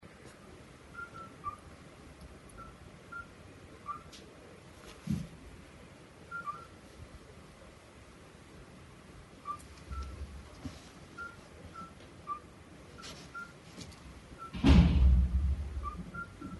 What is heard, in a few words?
night noise